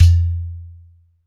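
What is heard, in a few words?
UDU LOOP; AMG - Now CD-ROM (Roland); Rhythm Of Life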